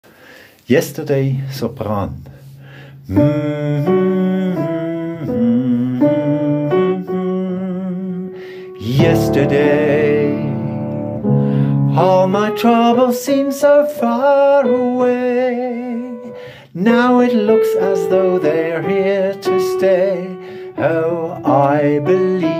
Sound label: Sopran